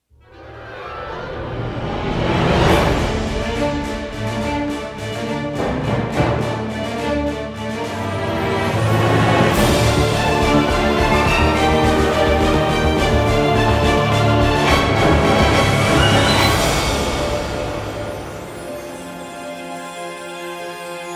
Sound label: Orchestral FX; Palette; Red Room Audio